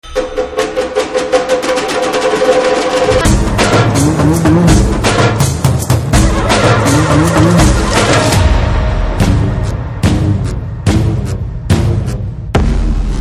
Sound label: Drum Beats; Rangasthalam; Telugu Ringtone